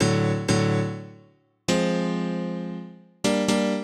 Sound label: House Piano (125BPM), retro house